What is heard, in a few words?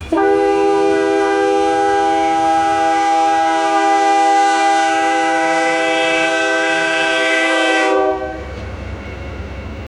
train horn; vehicle